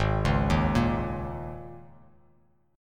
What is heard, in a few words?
G sharp Suspended 2nd Suspended 4th; arpeggiated; 4,4,1,3